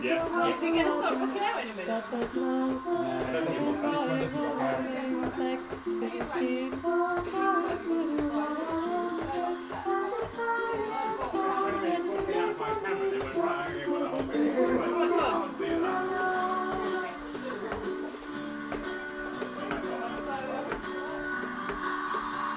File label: rockband party